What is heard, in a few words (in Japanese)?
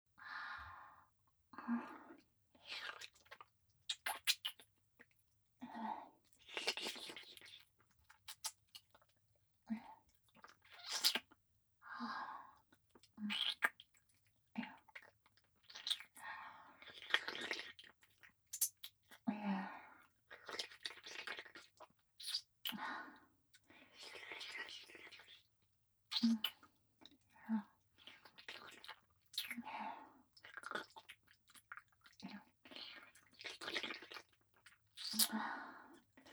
右斜め下・フェラ・普通; ミーシャ